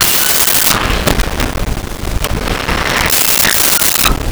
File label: Door Squeak Medium; Home & Office